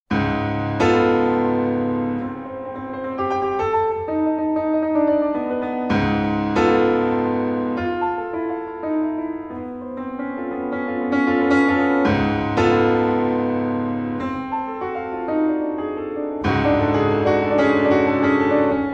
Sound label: Toccata; MHofer